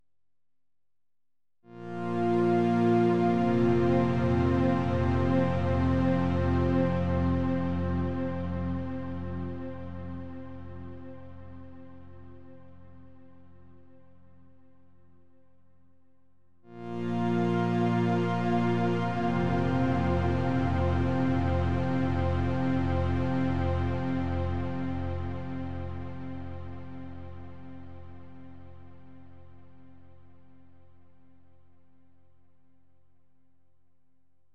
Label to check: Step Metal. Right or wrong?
Wrong